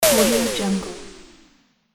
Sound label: ski game landing tumble